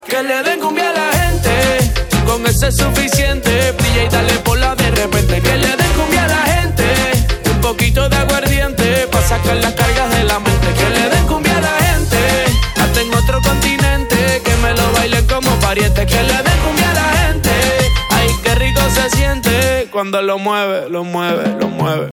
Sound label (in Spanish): cumbia